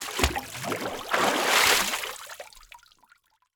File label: Liquid Water Water Dunk And Rise Hands In Water